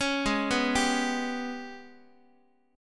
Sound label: G sharp Minor Major 7th Double Flat 5th, Guitar; arpeggiated; JGuitar